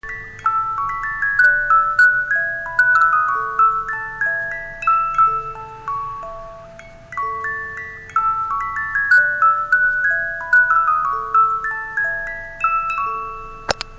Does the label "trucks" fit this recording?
No